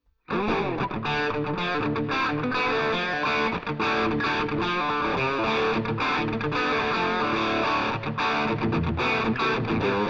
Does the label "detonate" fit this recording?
No